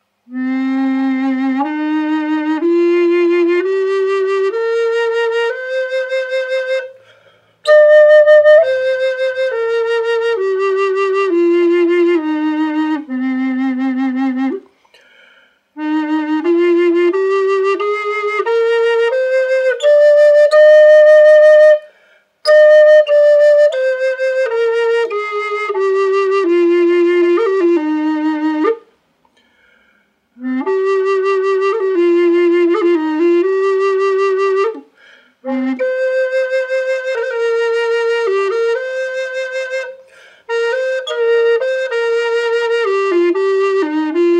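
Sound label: bass C minor birds eye inlaid dry, flutes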